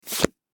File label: card flip